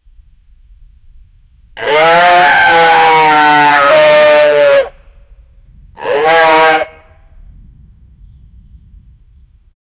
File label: deer fast loud sound